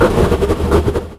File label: rumble long in, Kart